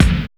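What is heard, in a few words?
dark dirt kick; Drums In Your FACE!; NEWJACK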